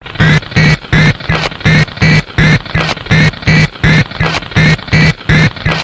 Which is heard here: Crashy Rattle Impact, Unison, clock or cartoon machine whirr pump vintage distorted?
cartoon machine whirr pump vintage distorted